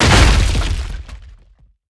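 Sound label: railcar impact; ambient; Half Life 2; Episode 2; outland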